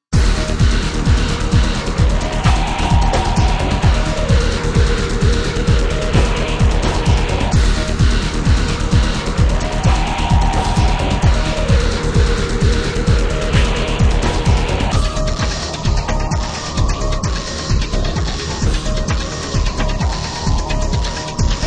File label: Battle, Firefight; X-COM Apocalypse